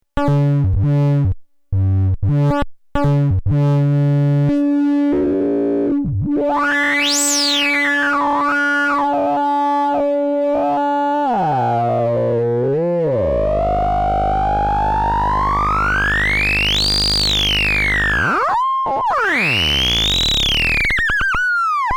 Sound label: Osc Mode Audio Input